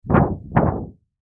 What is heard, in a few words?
Plastic Wobble Sheet; Objects; Foley; Blastwave